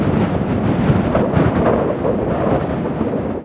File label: wind hit, ambient